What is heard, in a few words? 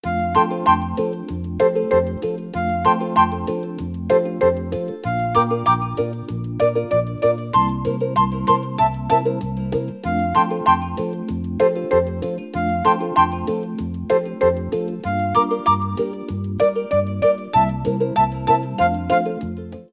game music